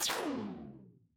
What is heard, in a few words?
retro game laser